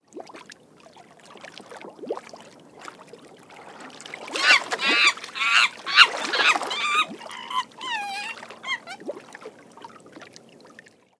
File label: Gondola Idle